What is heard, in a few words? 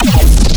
custom bass